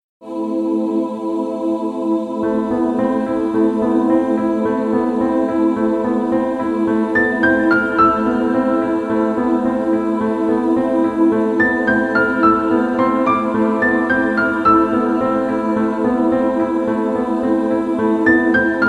sad piano melody

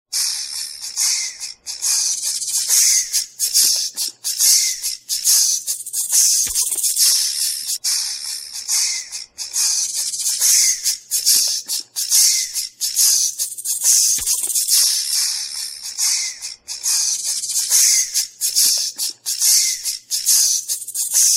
snake jazz ringtone

